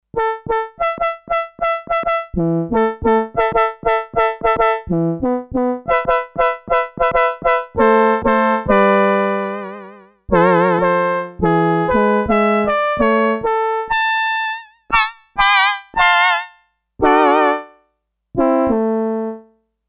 Digi-Horn, Sequential Circuits Six-Trak; Factory Programs

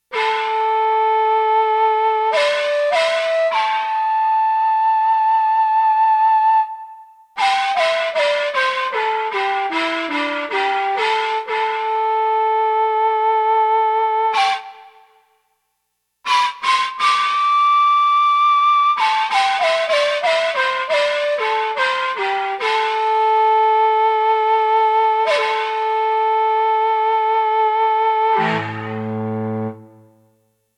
FLUTE, Roland JX-1; Factory presets

